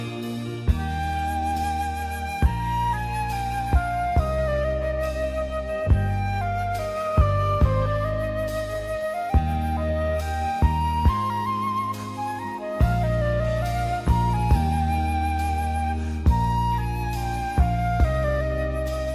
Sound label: The Pachelbel Best Instrumental Song Ringtone